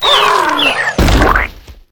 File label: rat queen, combat enemy; CosmicRage